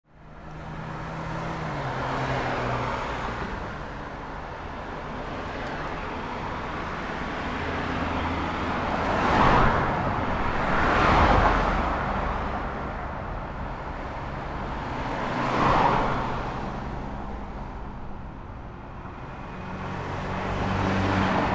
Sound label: street traffic